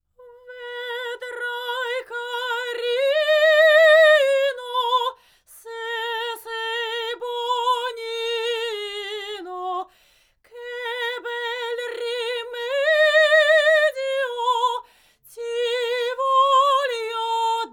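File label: domra, Neumann TLM170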